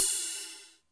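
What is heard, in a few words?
Cym Flange Jazz, Drum Machines Alesis D4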